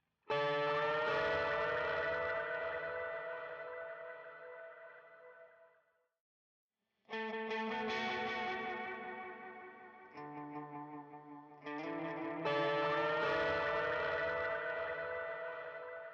gtrs; stems

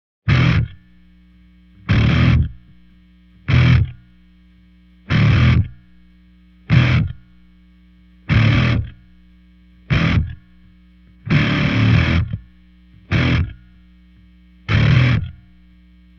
bass

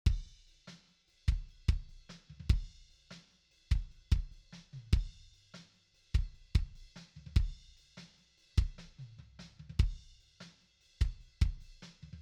kick

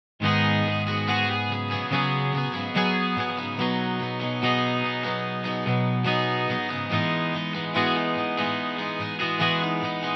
b gtr; Stems

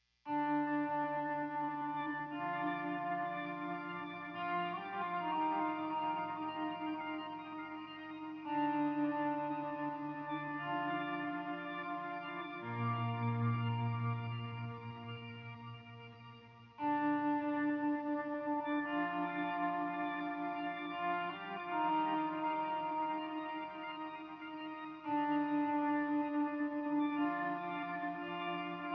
gtrs; stems

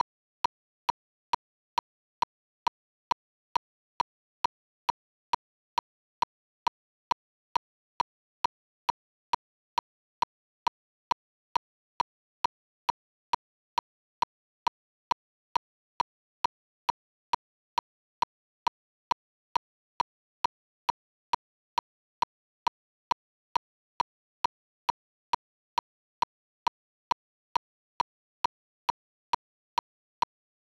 click

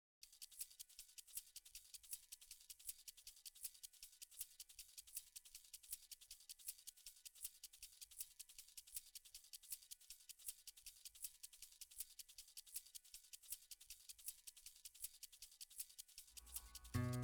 perc, acoustic

